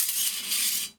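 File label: steal metal rod scrapping swipe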